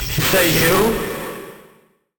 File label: curved notice